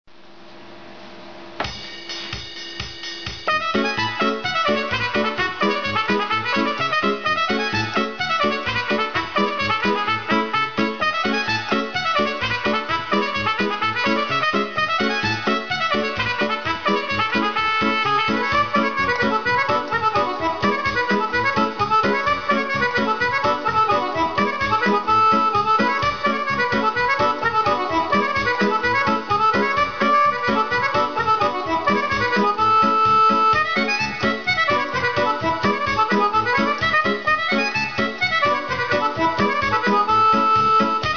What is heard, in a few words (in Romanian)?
Muzica populara Moldoveneasca Yamaha PSR S500